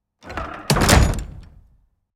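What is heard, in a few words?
doors wood double close; wood double light 01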